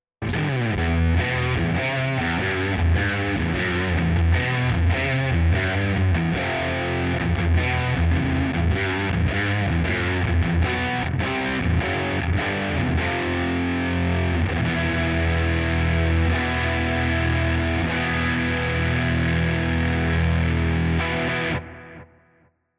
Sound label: Brit Fuzz Trem